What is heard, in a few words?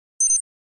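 Flash Beep Electronic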